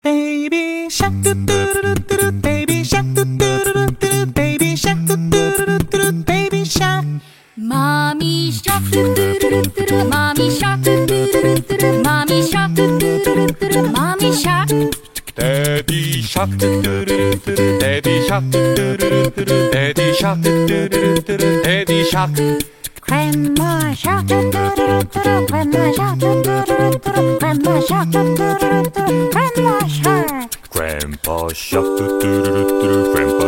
Acappella

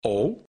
ohh

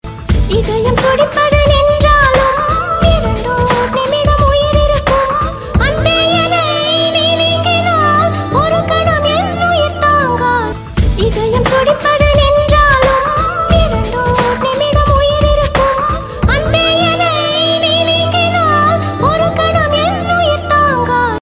Tamil

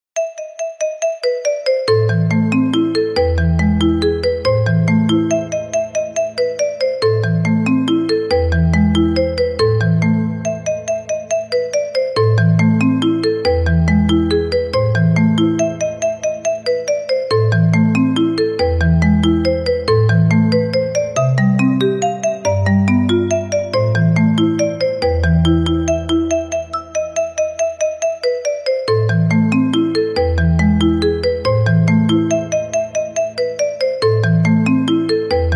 Fur Elise Marimba

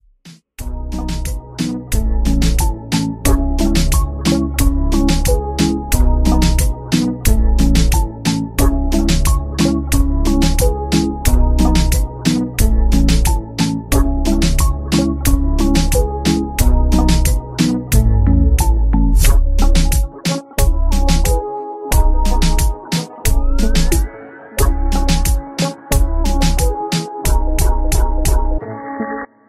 INSTRUMENTAL

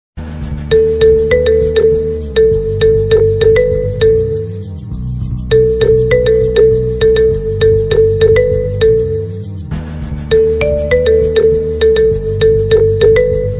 Marimba

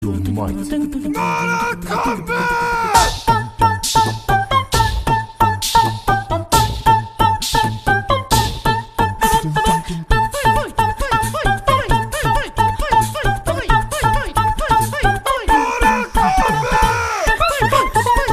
Acapella; MORTAL KOMBAT

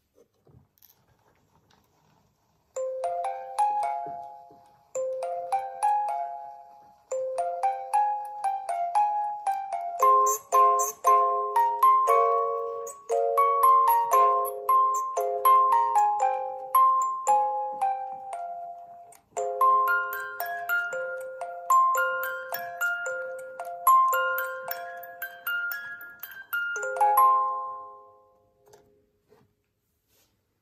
Music Box

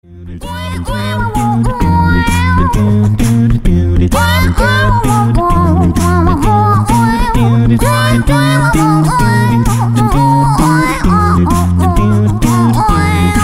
Acapella; Hill Climb Racing

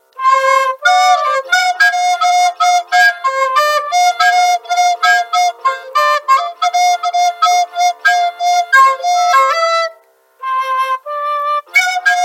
band

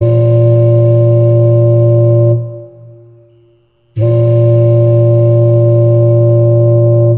Ship Horn Sound

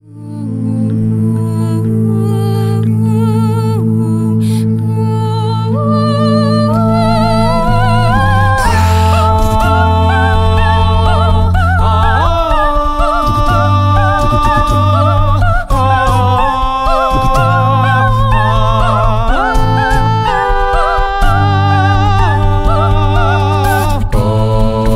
Acapella; Mobile Battlegrounds

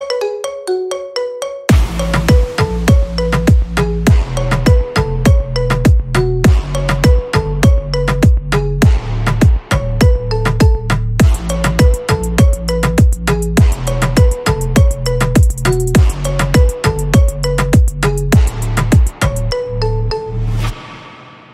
Marimba

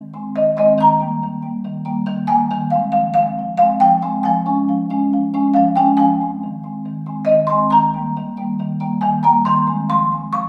Marimba

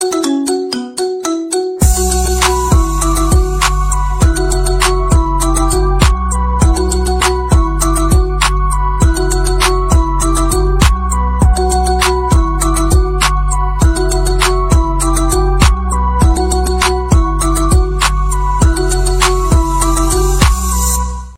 Marimba

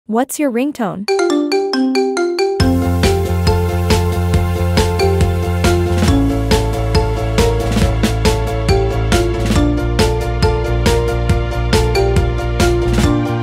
Ringtone Marimba Remix

